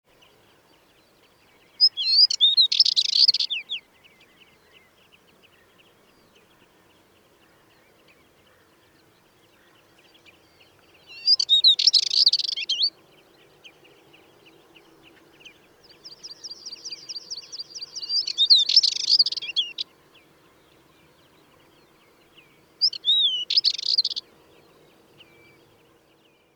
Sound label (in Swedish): Glasogonsangare song